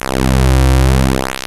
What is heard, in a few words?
PULSE MOD